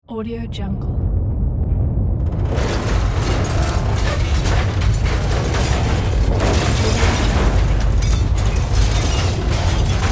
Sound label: earthquake